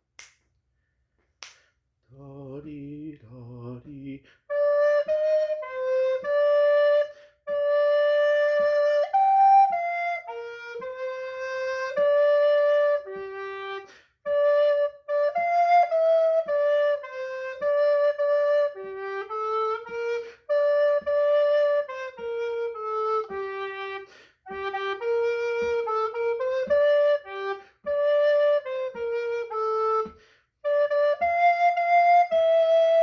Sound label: Tenor Part B, recorder